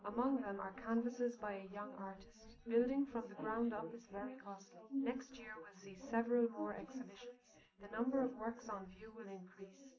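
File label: female, live recording 250ms 5cm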